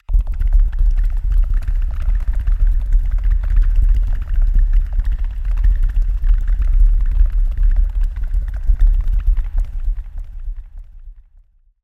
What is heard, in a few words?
SFX Void Creature; Sylenth1; Routed Sound; Sylenth1 Expansion - Quantum Enforcer Vol.1